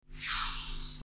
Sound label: flip sound